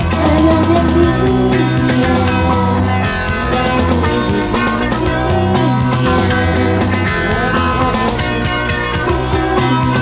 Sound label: scream